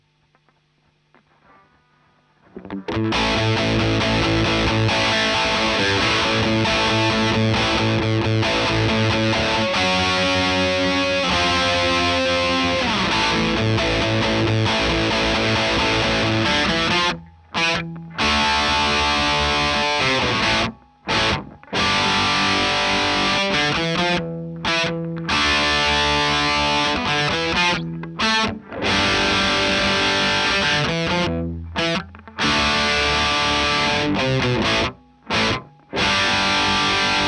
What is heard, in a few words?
Marshal Gtr